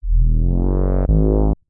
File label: TECHNO BASS 140 A